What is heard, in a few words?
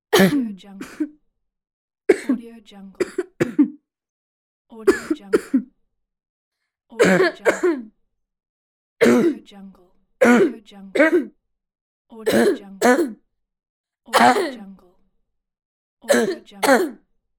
boy cough